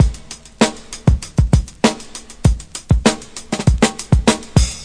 classic break, DRUM LOOPS and BREAKS

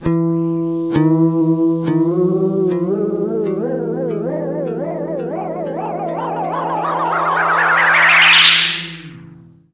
guitar note goes crazy

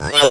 speak n spell ewwowl, CIRCUITBENT; Speak and Spell

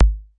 kick, drums single hits; iElectribe Kicks

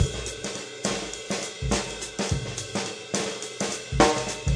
105 swingy jazzy, drum loops and breaks; 101 to 110 bpm

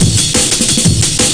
177 bpm firefight, drum loop

